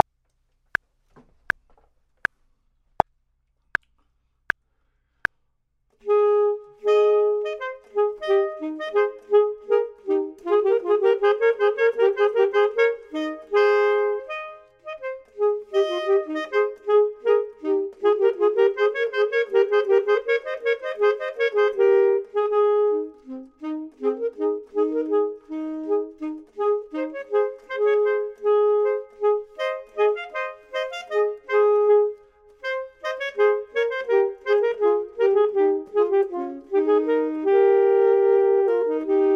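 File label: both, Saxophone and Clarinet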